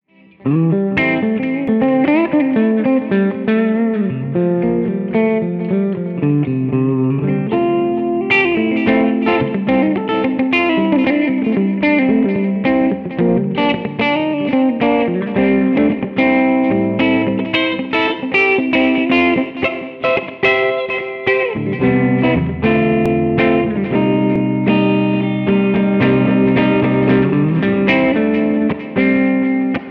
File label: penta twang